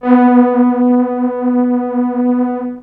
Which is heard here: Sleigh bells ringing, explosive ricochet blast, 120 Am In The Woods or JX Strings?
JX Strings